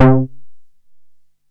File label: synt, Juno-2; Giga Samples Collection; Bass